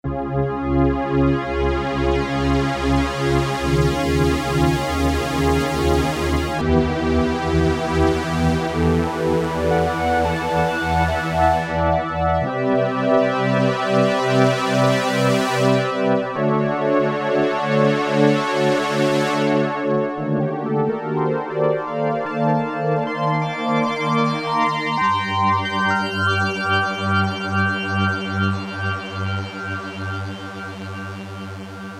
FADING DETUNE, Oberheim OB-8; Factory Patches

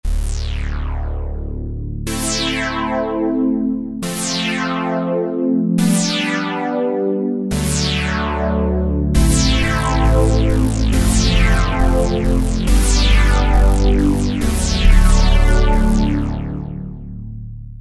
One Shot Reso, Roland JP-8000; Factory Patches

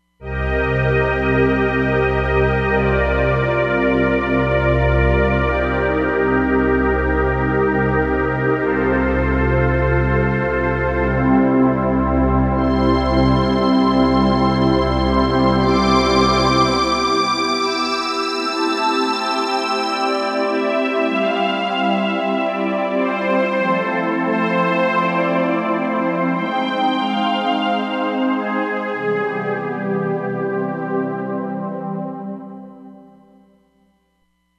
Reso Pad

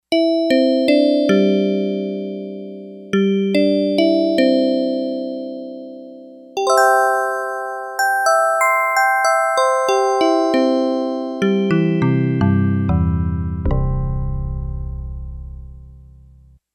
Bell

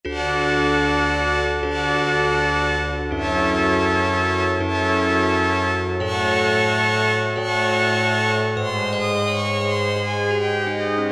ORCH-CHIME, Yamaha DX7

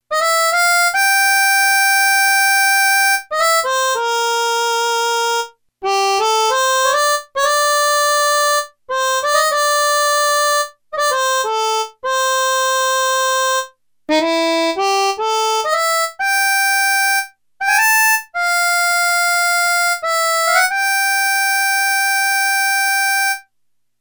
Harmonica

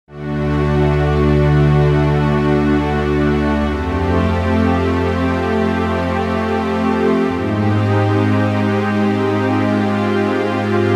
JP-8 Strings, Roland JV-880; Factory Patches

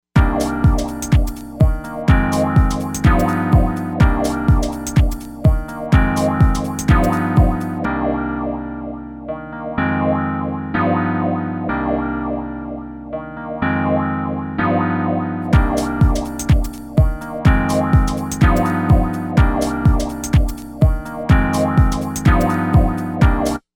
Acid Riff 125

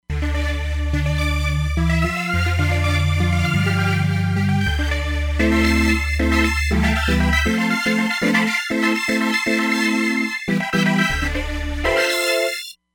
Roland Fantom XR

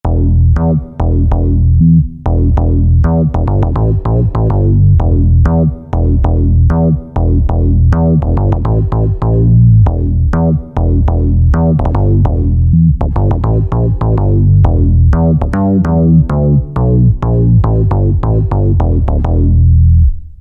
BASS

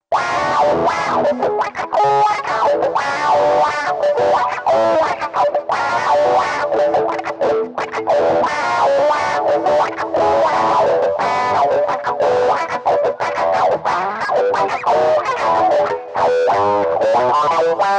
WAHS UP, Digitech RP200

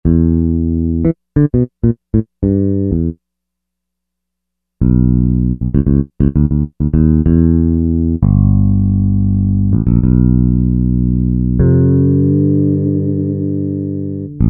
6-Str Bass, Roland SRX-03 Studio SRX

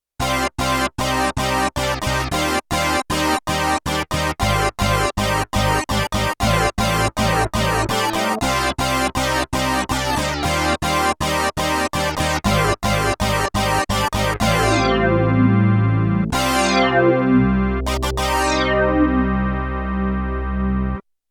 Double Unison VCF Brass, Prophet 5; Yamaha TX16W

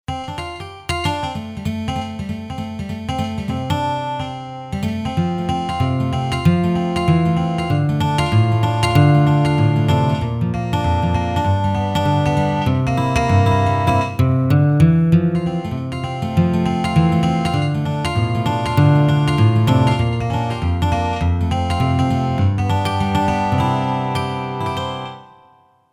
Picked Guitar, Roland JV-880; Factory Patches

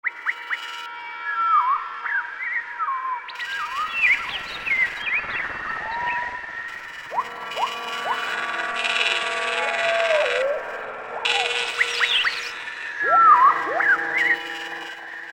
Ufo Natr Study, Special FX; Roland SR-JV80-15 Special FX Collection

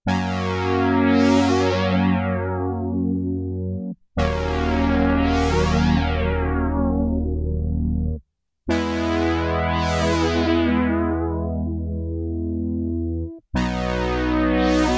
SPATIAL BRASS, SIEL DK 80; Factory

